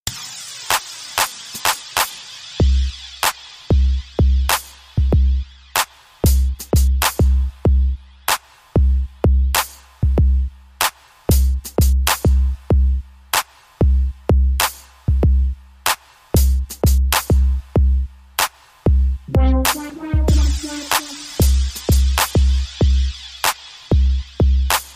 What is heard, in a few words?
Dr Ray Beat